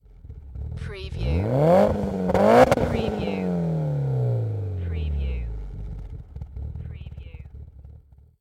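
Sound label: MITSUBISHI EVOLUTION EXHAUST DOUBLE REV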